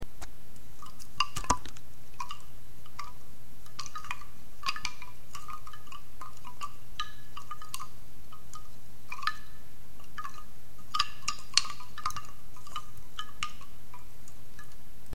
puust tuulekell

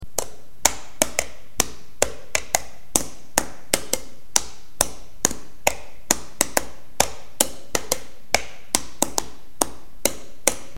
topsipill

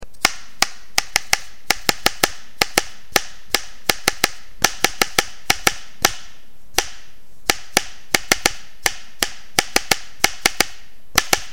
klõpsutaja

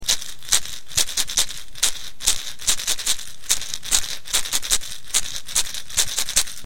pulgakõristi

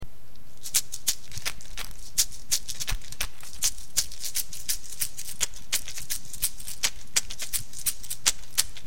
kõristi

